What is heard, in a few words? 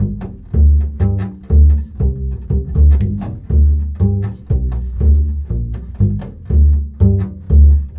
Bull Fiddle, Appalachian